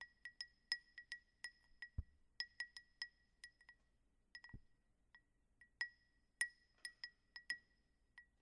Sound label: CERAMIC BELL, BALLROOM